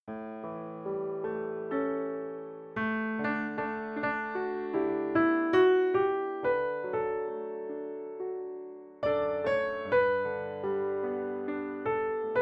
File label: Melody